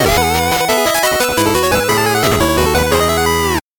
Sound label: 8bit jingle normal